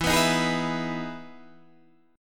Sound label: E Major 7th E down; EM7 strummed; JGuitar